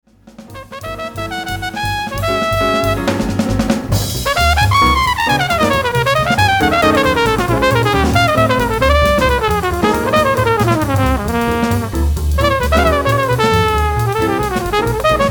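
trumpet solo, A modern hard-bop song built on a rhythmic bass pedal; This modern hard-bop song has a relatively simple melody throughout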